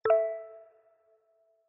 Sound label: notification